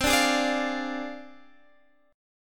D Minor 7th Flat 5th C, guitar chord

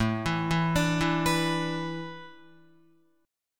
A Suspended 2nd Suspended 4th, Guitar; Asus2sus4; arpeggiated

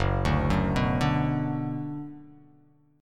F sharp Suspended 4th Sharp 5th, guitar; arpeggiated; F#sus4#5

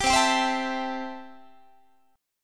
C 6th C down Guitar; strummed; 22,20,22,22,20,20

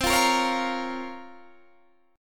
Bb Minor C, Bbm/C; Bbm/C strummed

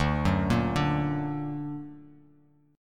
D Augmented D down Bass Standard; D+ arpeggiated; JGuitar

